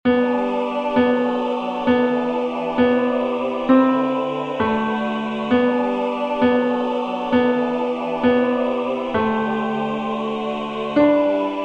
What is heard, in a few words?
tenor; Ave Maria; Busto